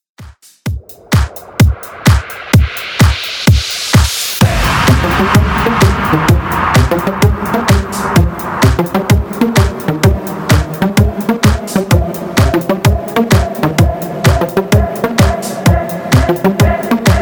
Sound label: Clubmix Redrum 128bpm